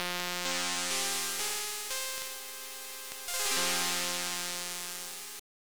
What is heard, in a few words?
Transfer Beeper; The Sentinel